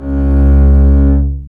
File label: STR ARC BS, Cb Bowed, Cb1 mf vb; String Master Series